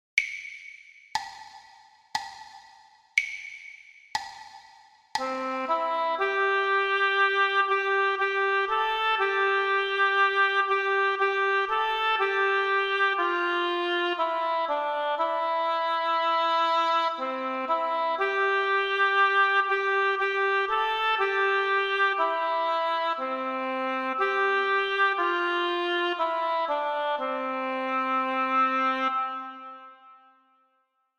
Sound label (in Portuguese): percepcao ditado